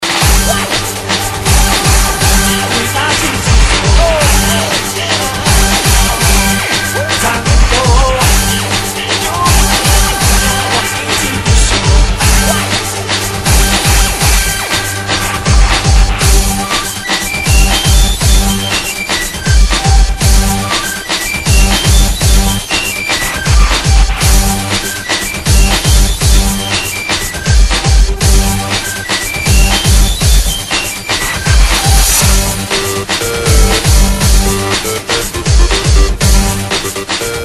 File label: funky breakbeat remix